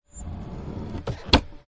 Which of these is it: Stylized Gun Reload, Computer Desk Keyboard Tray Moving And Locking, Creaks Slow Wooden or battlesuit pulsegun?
Computer Desk Keyboard Tray Moving And Locking